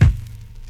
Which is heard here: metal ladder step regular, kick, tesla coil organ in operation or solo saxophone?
kick